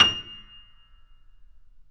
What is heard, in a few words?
Mf F 2 off, Grand Piano off mic; AKAI S6000 CD-ROM - Volume 2